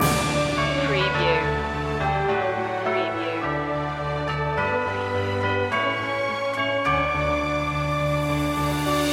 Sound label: orchestral loop; joy to the world; woodwind